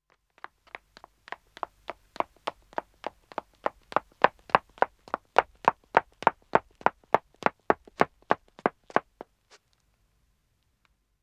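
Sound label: Wood (Dead Acc) Running 1 woman; Footsteps; BBC Sound Effects Library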